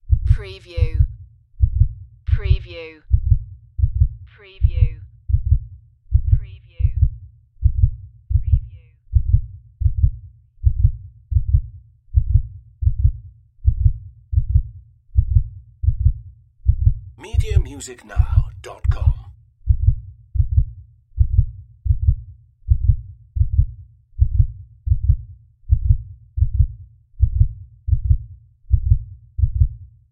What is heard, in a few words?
Heartbeat Fast Low